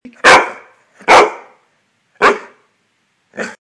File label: woof woof